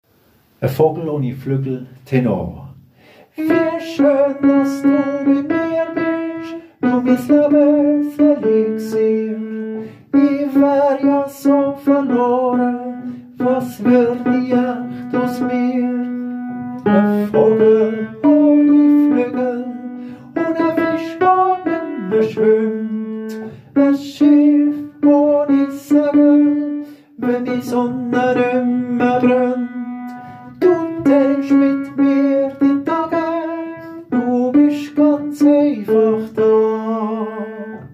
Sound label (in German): E Tenor